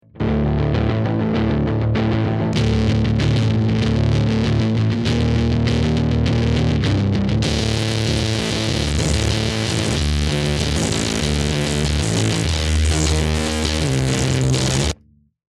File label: bass saw; deathbyaudio; waveformer